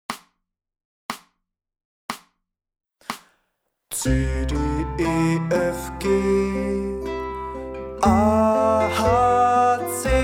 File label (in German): Tonleiter Lied in Dur; die bunte gitarrenschule band 2